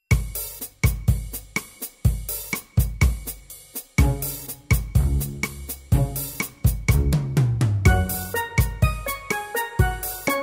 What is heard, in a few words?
drum bar humba